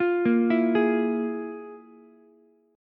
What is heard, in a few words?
F Minor Major 7th Double Flat 5th, Ukulele; FmM7bb5 arpeggiated; JGuitar